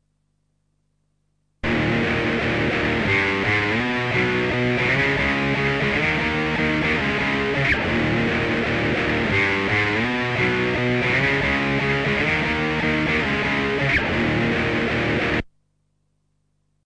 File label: lofi gtr loop